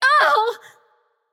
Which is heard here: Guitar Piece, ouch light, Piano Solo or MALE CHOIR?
ouch light